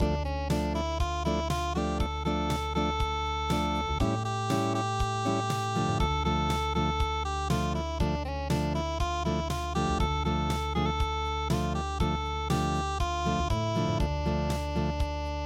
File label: Joshua Fit The Battle Of Jericho unpluged